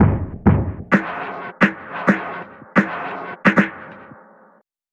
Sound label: DRUM BREAK